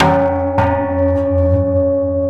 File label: Void Perc; The Void; Processed Drumkits